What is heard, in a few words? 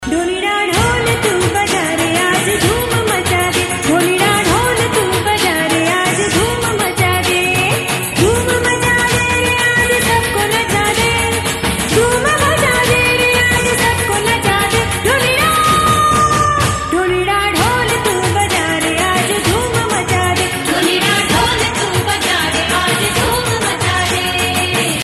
Dhol; Navratri; Dandiya Mix; Khelaiya; Hindi